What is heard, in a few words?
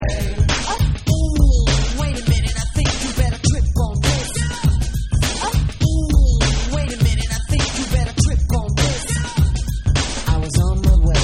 ORGAN GRINDER